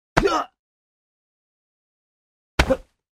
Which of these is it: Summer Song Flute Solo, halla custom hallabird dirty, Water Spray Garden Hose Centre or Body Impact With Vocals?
Body Impact With Vocals